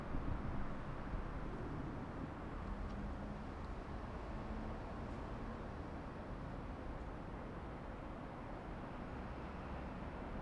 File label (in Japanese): 上り電車通過。; 録音したファイルを、本体でノーマライズしました。; ZOOM ヘアリーウィンドスクリーン WSU-1; H5studio 内蔵マイク